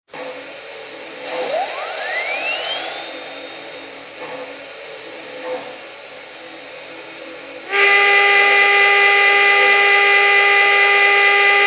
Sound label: alleghny